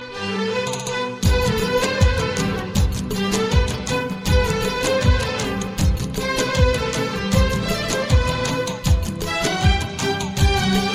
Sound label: Nice Iraqi Music